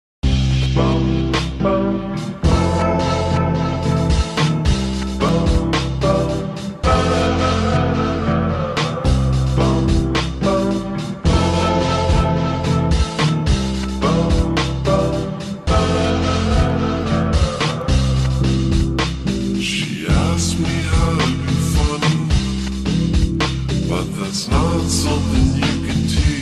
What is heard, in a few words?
slowed